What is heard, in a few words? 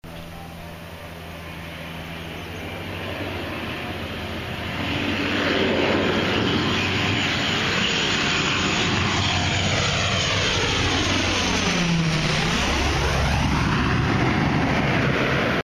real airplane